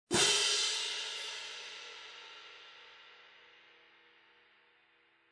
20" Artisan Traditional Symphonic Medium Light Cymbals; hand cymbals; Sabian